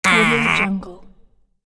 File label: short pain critter monster voice